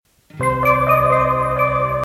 Melody Msg

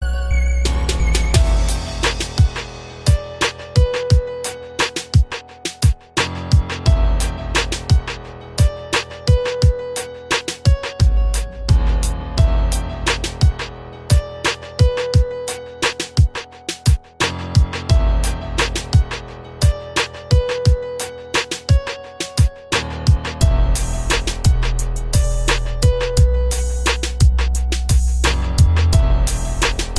beat

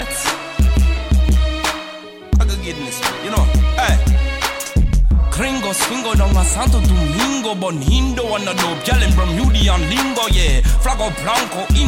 reggae mix

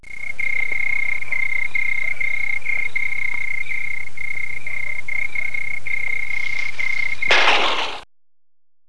Cricket

